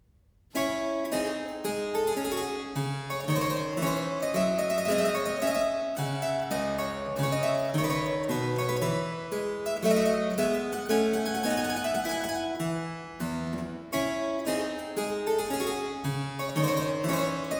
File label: Aire, harpsichord; Complete Chamber Music; Brilliant Classics; Miscellaneous pieces for the harpsichord